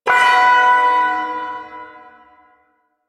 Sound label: Shawzin Chromatic Chord; Duviri; Ersatz